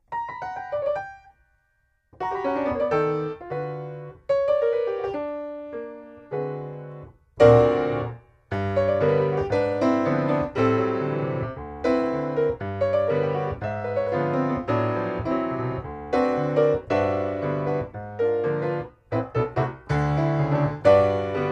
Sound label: Little blues